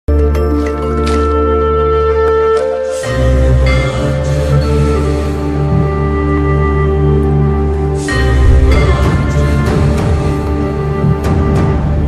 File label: Devotional Telugu ringtone